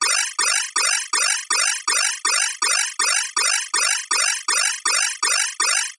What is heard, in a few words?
science fiction console alarm